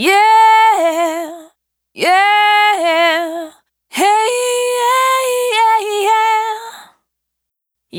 Brooy, Single Voice Melodic Loops; Singles

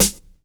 KIT HOU; Best Service; Dance Mega Drums

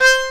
ACCORD C4, Accordions

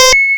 alarm, FX & ATMO, ALARMS & ALERTS; Techno Trance; Techno Trance Essentials

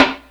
snare; PlatinumPhattCD1; E-MU Producer Series Vol. 8 – Platinum Phatt

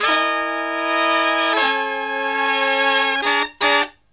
Brass Riffs

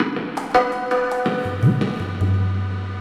Pure Tabla, 080BPM; Zero-G

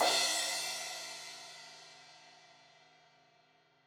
Crash 16in edge, Cymbals; Deeply Sampled Drums

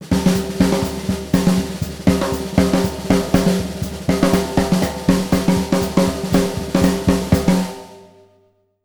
BRKBT, Jazzistic

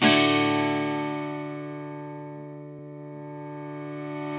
GTR, Contemp. Pop

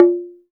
SMALL DRUM, CHINESE PERC; Spectrasonics; Heart of Asia

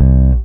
E bass (C short); Sonic Foundry (Sony Creative Software); Ugly Remnants VOL-2; Basstuff

